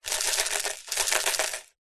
noodle pot dried plastic shake fast; zapsplat

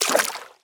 fantasy Footstep Water; little robot sound factory

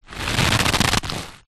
foley rope stress tighten creak; zapsplat

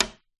foley metal tap knock window; zapsplat

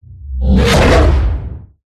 whoosh; Audio Mangler

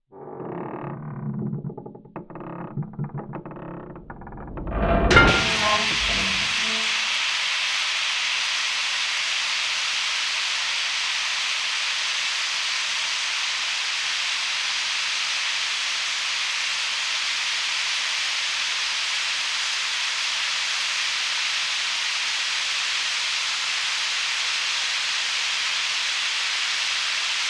Steam Pipe Burst; Blastwave FX

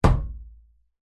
impact metal thump front washing machine

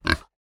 animal pig kunekune grunt snort

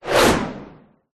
sweep transition fast whoosh airy; sound design; zapsplat

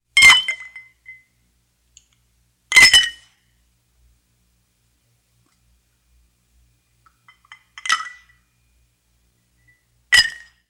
food drink ice cubes into full glass many times; sound effects one

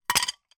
foley broken dish piece down on other; zapsplat

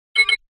Beeps; PMSFX

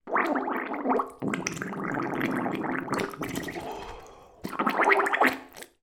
human blow bubbles mouth bath water

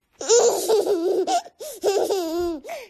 12month baby SMOOCH long laugh giggle

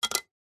industrial tool set down on concrete; leatherman

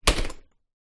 household pull along shopping trolley stand up

